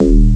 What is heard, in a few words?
synbass; Basses